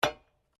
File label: SLEDGEHAMMER METAL IMPACT MEDIUM